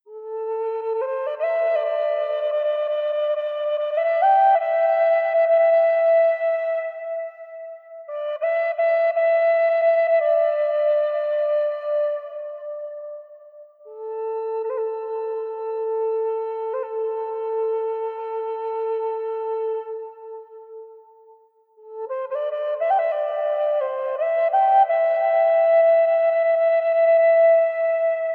Native American Flute for Massage Meditation & Healing